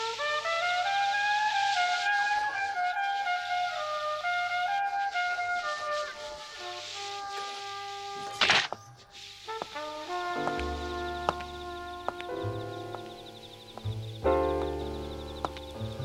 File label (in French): anniversaire trompette